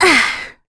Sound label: Vox Landing; Reina; kingsraid